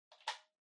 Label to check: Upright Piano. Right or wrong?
Wrong